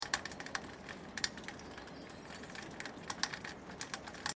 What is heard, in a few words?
Spring birds with light dripping of rain from rain gutter. Calm, quiet, enviroment.; LYN101 package of City Sounds